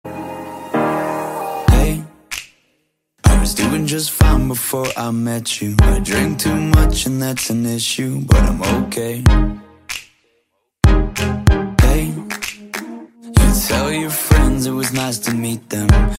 samsung ringtone

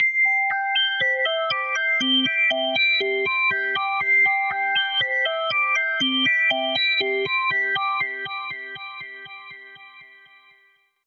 vivo v7 ringtone

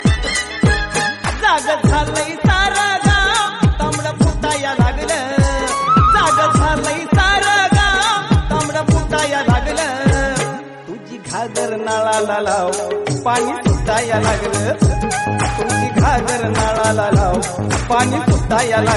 marathi dj ring

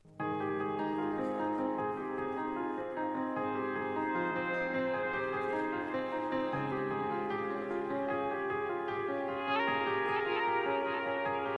slow piano ringtone

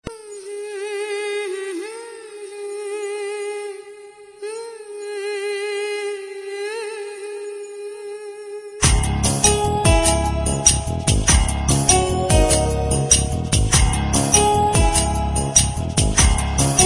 tamil soft beat tune

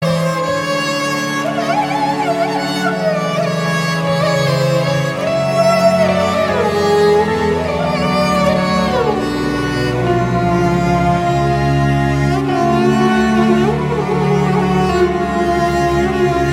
instrumental ringtone

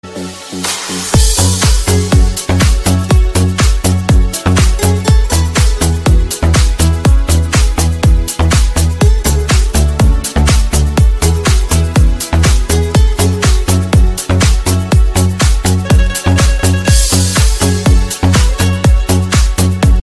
epic iphone 8 ringtone

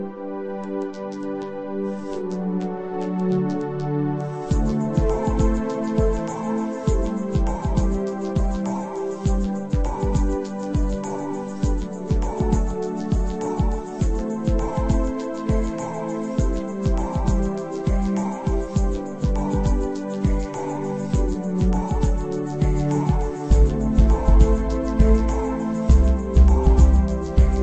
ambient window ringtone

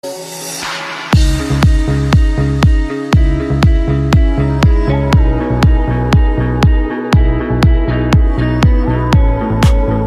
romantic music ringtone

